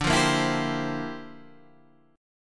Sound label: G Minor 13th D